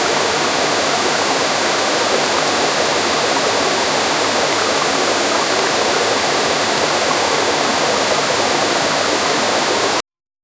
a gushing waterfall cascading down rocks in a tranquil forest